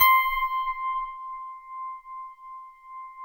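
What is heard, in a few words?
FM electric piano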